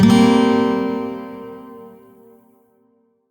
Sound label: Shawzin Blues Chord K; Warframe; Lotus Shawzin